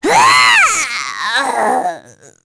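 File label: Vox Dead, Korean; heroes; Nia; kingsraid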